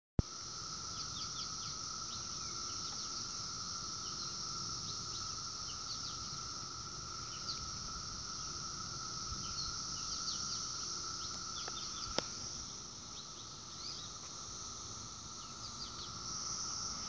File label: cicadas